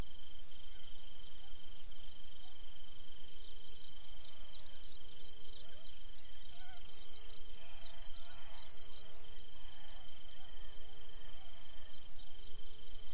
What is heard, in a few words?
Plains wanderer